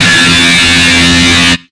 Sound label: shredding, Guitar